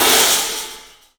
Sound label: CYFX, EFFECT CYMB; 3D Sound; 3D Drums